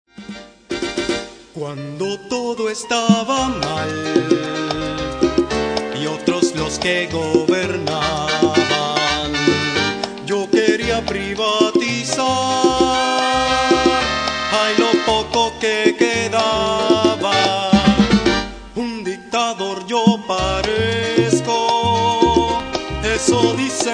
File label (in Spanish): Salsa